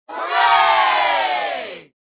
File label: cheer hooray